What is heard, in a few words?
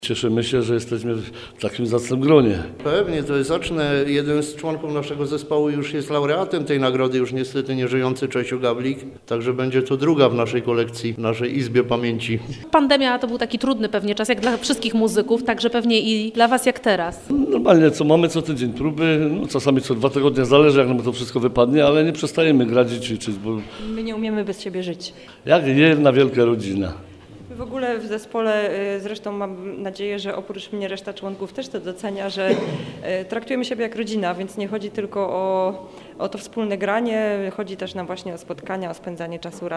brass